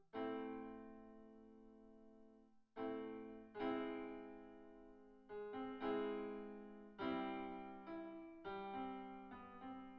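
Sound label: no drums synthetic convolutive 1m; SASSEC07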